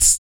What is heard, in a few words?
VOC PERC, Vocal Planet Jazz FX BASS PERC